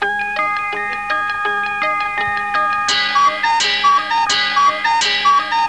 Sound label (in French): coucou; Un autre coucou